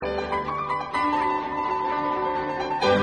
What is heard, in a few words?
trill piano